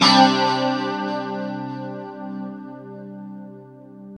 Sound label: 2CHD C 4 -R, Guitars; Spectrasonics; Hans Zimmer Guitars VOL-1; JC-SHEEN